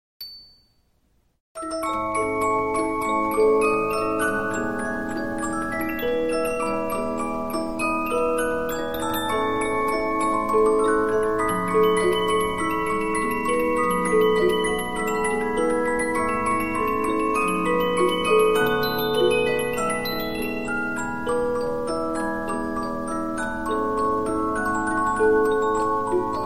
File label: Polyphon, 15inch disc musicbox